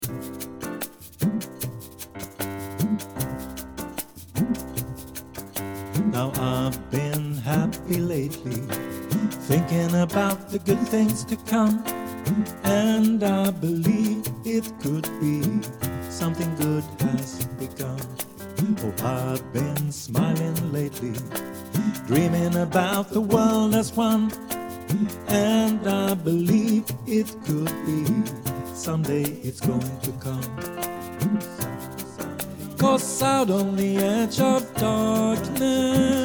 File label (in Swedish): sopran